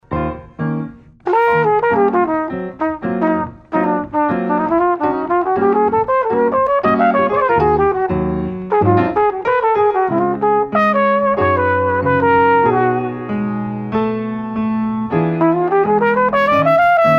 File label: solo, jazzpiano